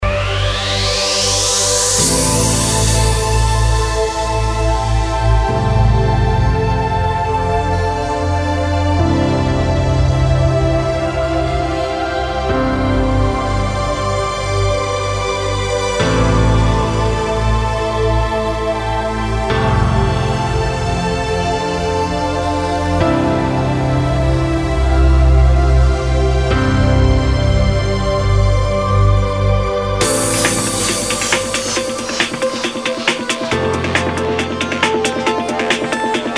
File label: trance track with catchy melodies